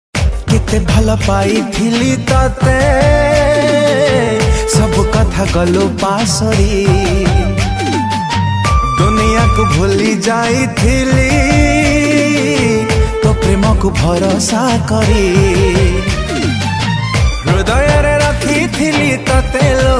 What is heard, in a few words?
Odia Sad